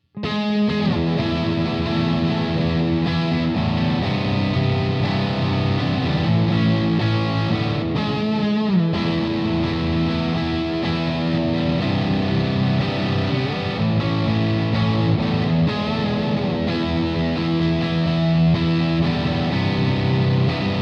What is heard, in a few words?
Les Paul ODR 1 Cranked Ghost Echo, spring reverb; belton brick